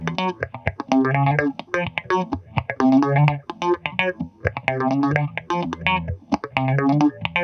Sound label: GTR, Roots Disco; USB Soundscan vol.32; AKAI